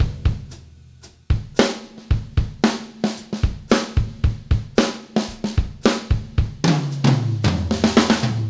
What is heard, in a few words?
Beat Loops; Zero-G; Monster Beats